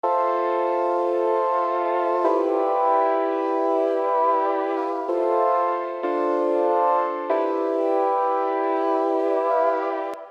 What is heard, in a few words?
190 BPM A Minor